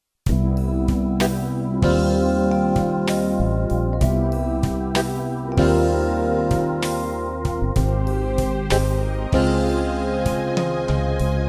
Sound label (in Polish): Jesienny walczyk instr